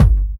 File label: bassdrum; Ueberschall; Jam Box